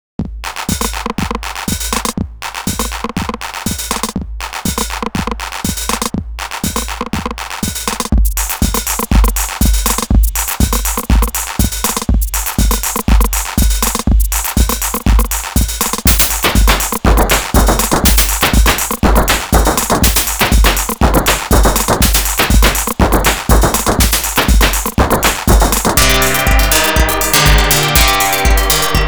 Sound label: White Noise; Original